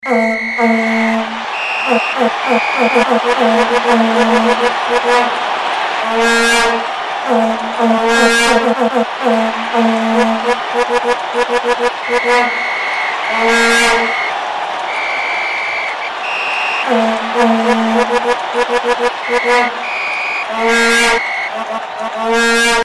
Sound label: Vuvuzela Soccer Tone